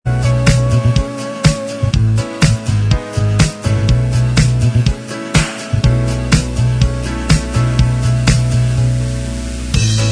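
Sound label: Instrumental Track, backing tracks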